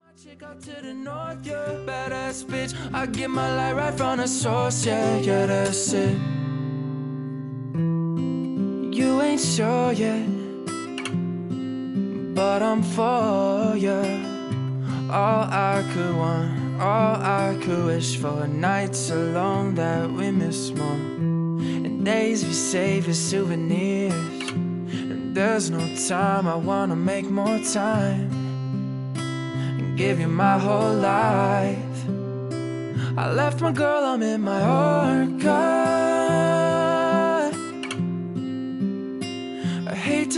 Acoustic

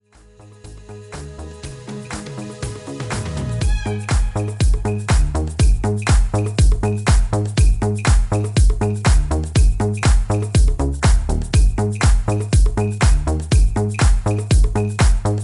Disco Extended Remix

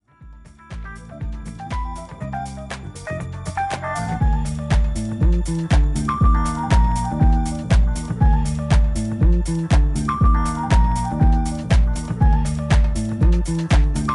Dusty Jazz

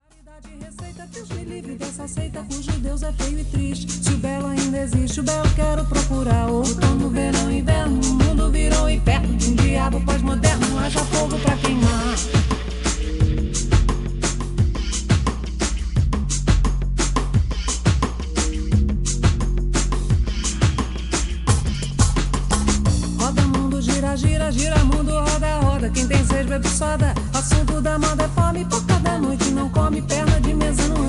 Brazilian Groove Electro Coco

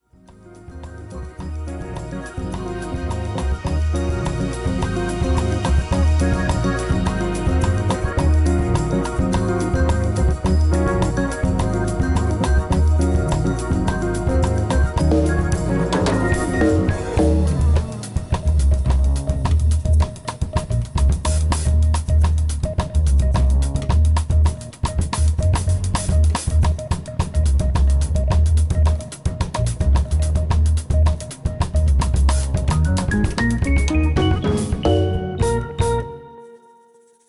Samba

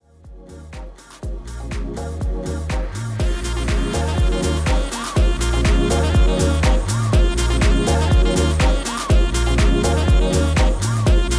Sax Mix

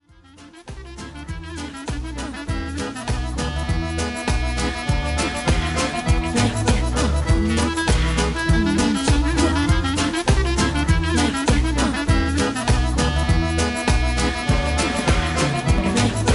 Klezmer Band

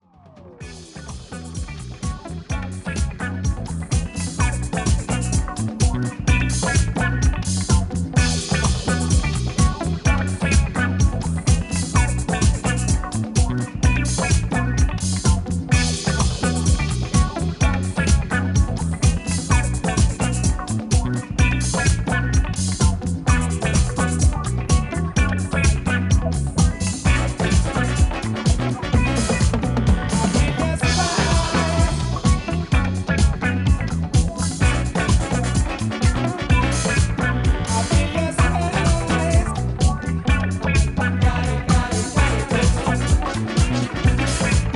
808 remix

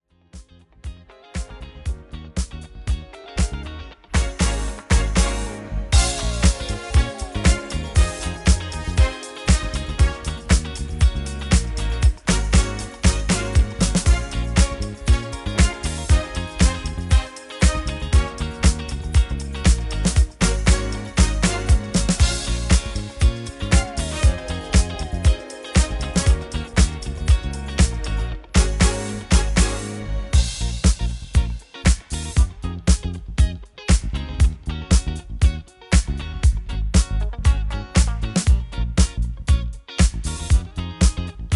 DISCO INSTRUMENTAL

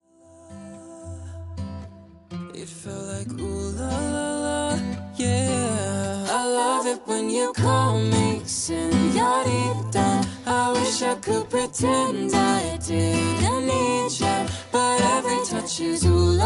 Acoustic

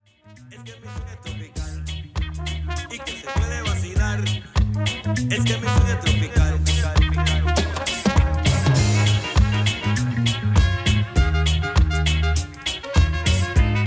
swing es tropical reggae remix

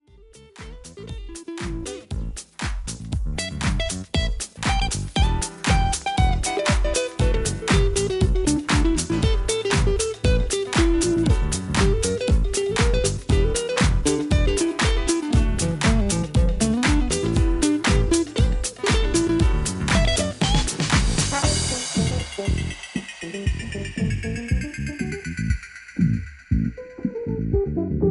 AFRO FUNK

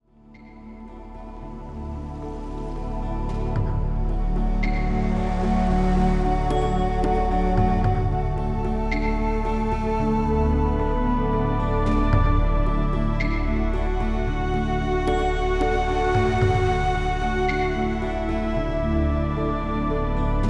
Tibetan Mediation Pranayama

